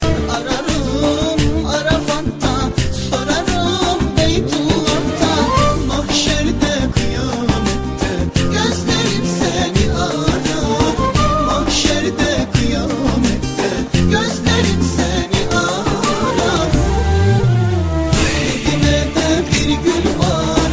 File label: islamic ringtone